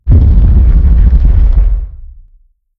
titan laser fire; weapons; Stellaris

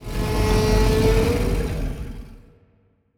kaiju thermoclastic roar; biogenesis

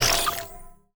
vivarium birth; Stellaris; grand archive

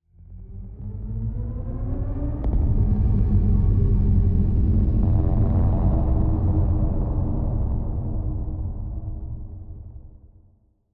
ship titan move; Stellaris; shroud; ships

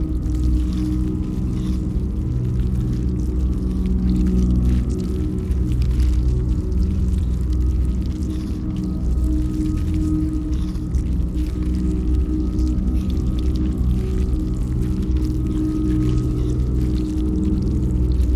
ant ship starbase idle; biogenesis

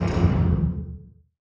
distance disruptor hit, weapons; Stellaris

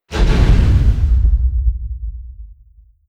distance dragon wing attack; Stellaris; guardians

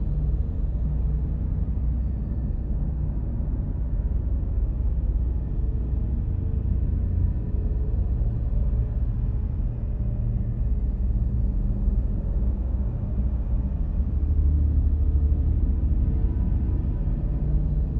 ship starbase idle; aquatics

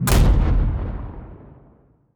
kaiju lightning hit explosion; Stellaris; biogenesis; weapons

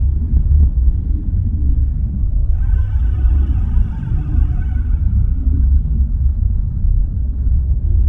dimensional horror portal; Stellaris; guardians

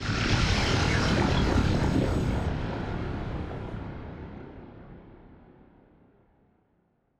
ship science ship move; Stellaris; machineage

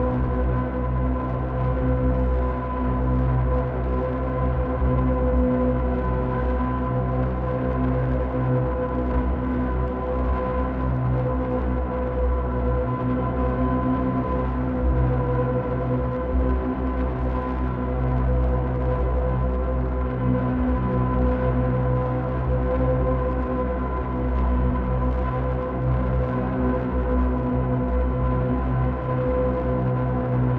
ship cruiser idle; Stellaris; shroud; ships

